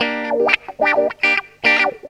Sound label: GTR, Funky Elements GTR RIFFS; Zero-G